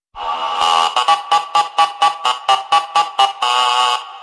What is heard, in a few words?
trumpet very short